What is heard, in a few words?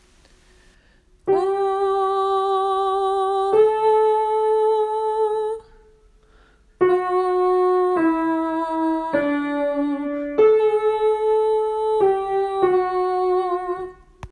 tenor; åfjord